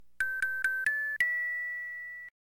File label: Logo Jingle; Nintendo; Clay Fighter; SNES